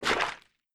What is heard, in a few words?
water; pipe mania